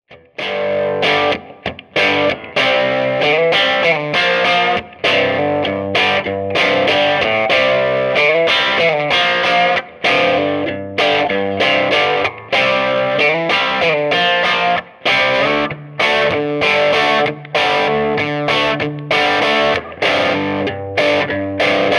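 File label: Rhythm crunch strat M pickup Gain 10 Boost off Treble 12 Middle 13 Bass 13 Master 13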